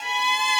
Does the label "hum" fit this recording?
No